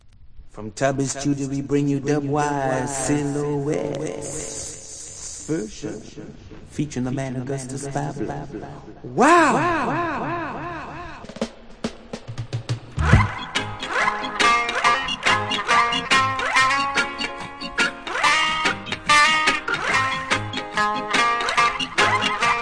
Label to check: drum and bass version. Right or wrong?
Right